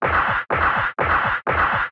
Retro Anime Robot Low

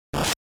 GB Fighting Hit

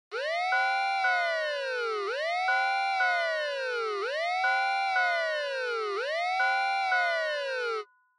Fire Truck Siren Fake Siren

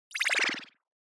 Scene Change Down Stereo Pan

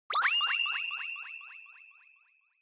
Motion Blow Away Long Delay

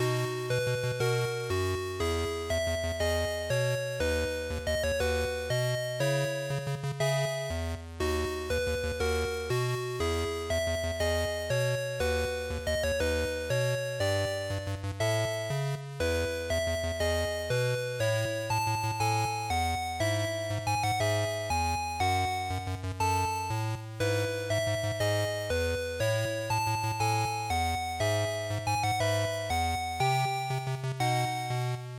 GB RPG Loop60

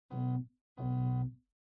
Air Conditioner Angle

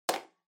Megaphone Hardware Mic Holder Put Back

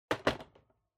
Electric Fan Put Down

